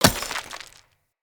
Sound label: Throwing Knives Impact Rock; Weapon Impacts; Environment